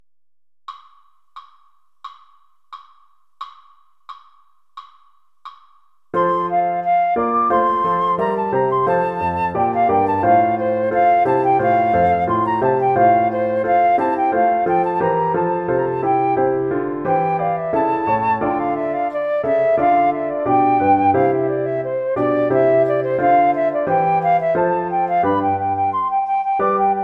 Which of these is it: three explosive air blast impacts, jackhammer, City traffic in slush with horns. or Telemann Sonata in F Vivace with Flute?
Telemann Sonata in F Vivace with Flute